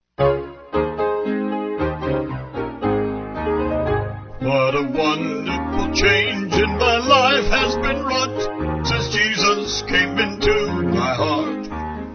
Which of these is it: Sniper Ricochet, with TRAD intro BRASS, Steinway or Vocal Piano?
Vocal Piano